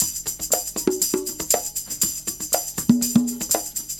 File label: Percussion, R&B Drums; Sonic Foundry (Sony Creative Software)